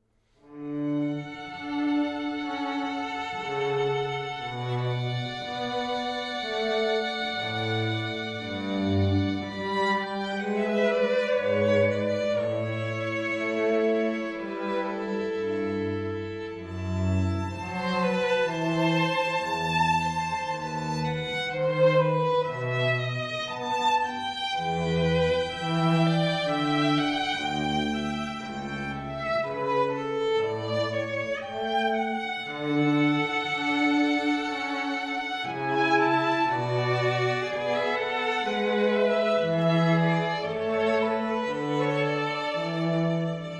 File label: String Trio